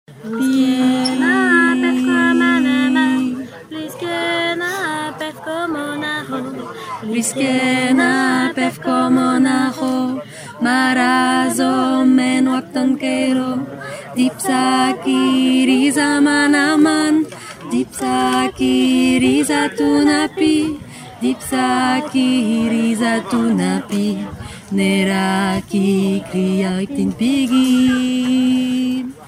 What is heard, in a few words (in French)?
basse; Ksenitiatouerota